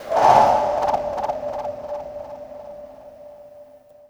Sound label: TRAIN TRACKS; Spectrasonic Distorted Reality 1